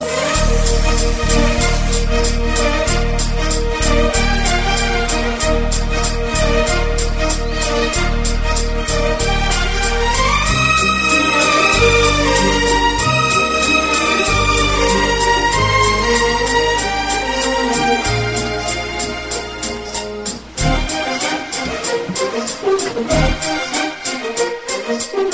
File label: instr; 7g rainbow colony